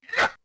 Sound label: Big kick; RedDot